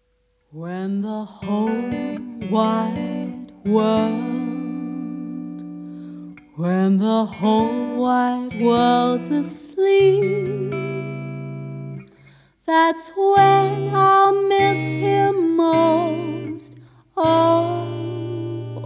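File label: Jazz Band, Latin Jazz Band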